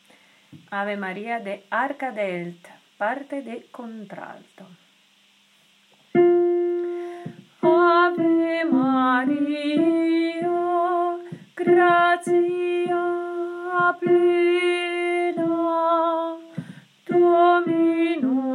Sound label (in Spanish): contralto ave maria